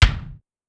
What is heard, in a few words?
Kick, Drum Machines DDrum-AT Pitched Down